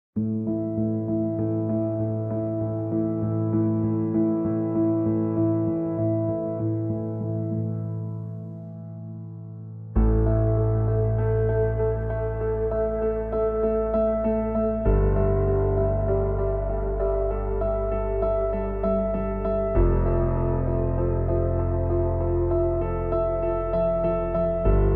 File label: Winter Night Slow